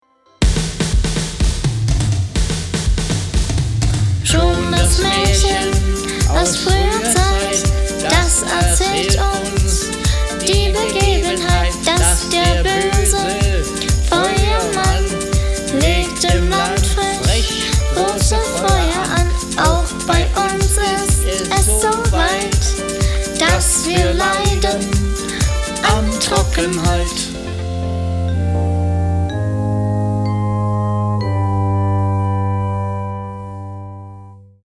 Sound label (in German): Klimaglocken drums